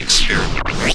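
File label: WEIRD SCRATCH, Vinyl Scratches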